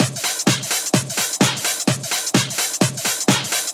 Minimal Loops

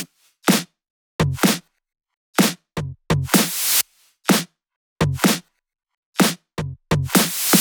Kit 12, 126BPM; Purple Kit; Vengeance Samples

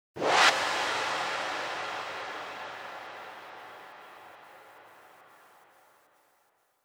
Reverse FX 140BPM; Sound FX; G minor; Vengeance Samples; VTS1 Devastating Truth Kit

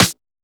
clap; Dubstep Sample Pack

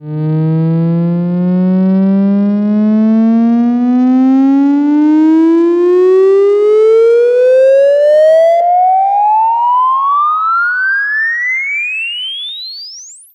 Sweeps Long

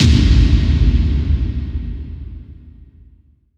Bassdrums FX, FX Kicks; Vengeance Samples

